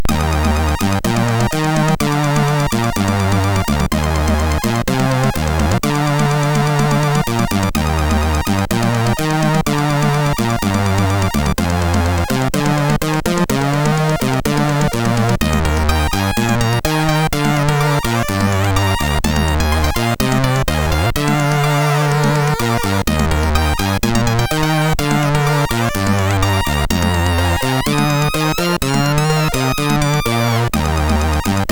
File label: Lady Lisa, MOS6581R3; hvsc